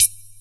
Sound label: CABASA; Real Oldies2